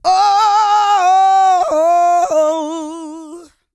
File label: GOSPEL